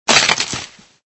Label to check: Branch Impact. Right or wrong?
Right